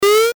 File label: jump